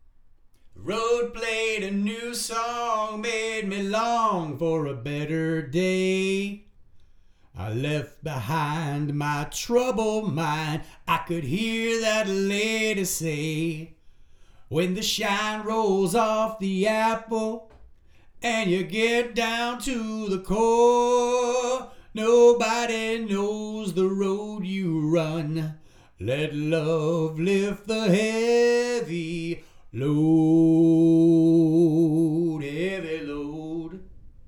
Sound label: vocals